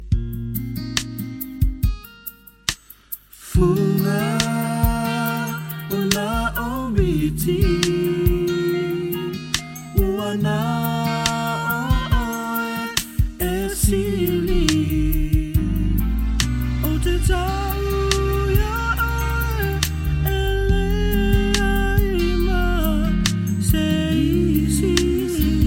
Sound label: Fuga